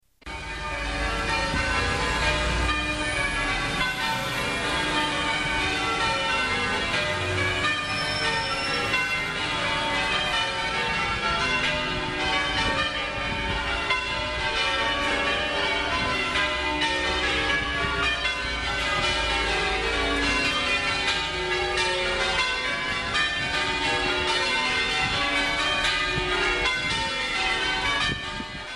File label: bell ringing